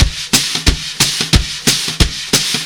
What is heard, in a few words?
COUNTRY